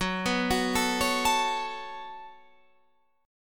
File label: Db 7th Suspended 4th Sharp 5th Gb, guitar